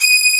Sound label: string Eb6; E-MU Formula 4000 Series Vol. 5; Protozoa; Proteus1 Presets